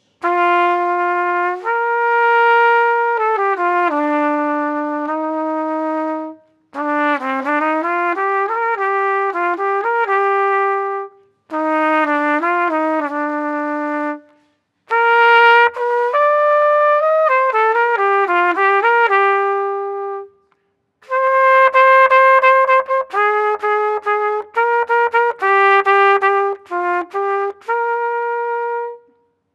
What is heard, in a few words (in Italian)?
Tromba riconvoluta microfono; Siracusa